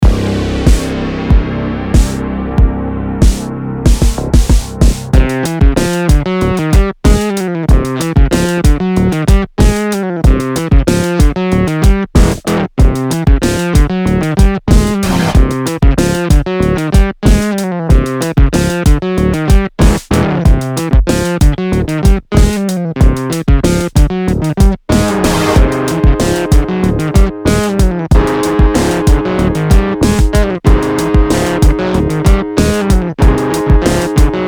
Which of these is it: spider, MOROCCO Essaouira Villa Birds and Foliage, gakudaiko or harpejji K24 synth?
harpejji K24 synth